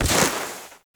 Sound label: Step Snow; Enemies; Foley; Footsteps; Warframe; Duviri; Dax